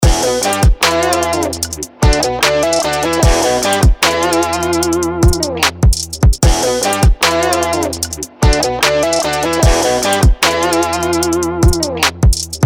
Rock Guitar Trap

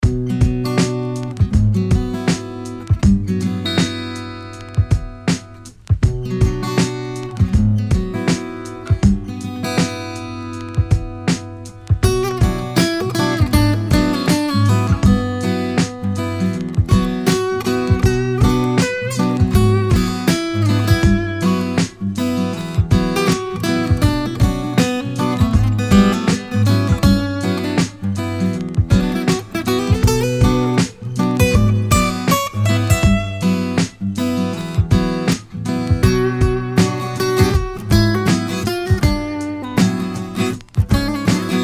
Mellow Acoustic Guitars

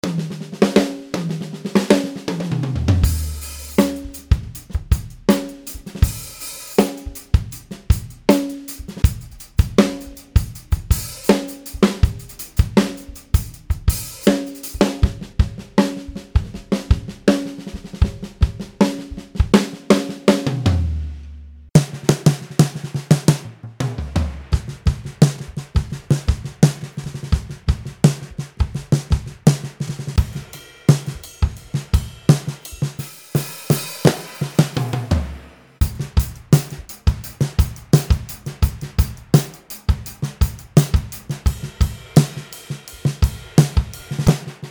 Snappy Beats

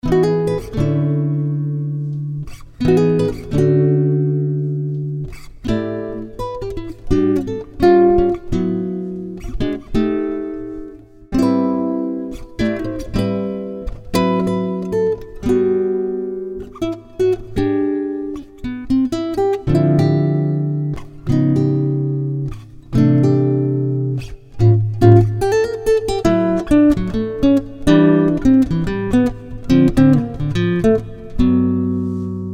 Nylon Guitar Jazz

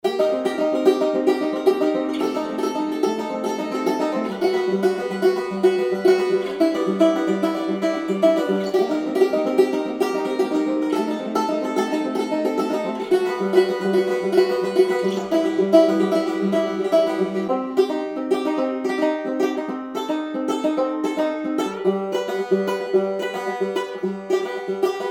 Banjo Grooves

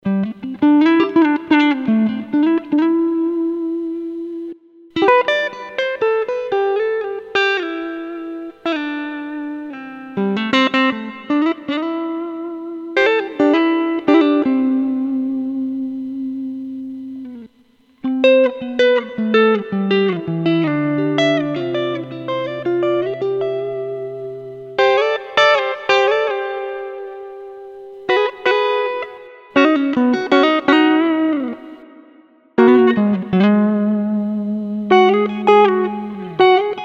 Soulful R&B Guitar Licks

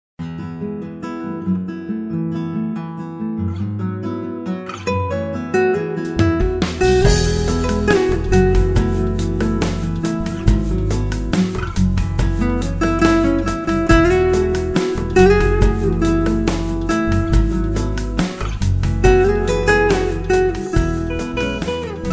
Chilled Guitar

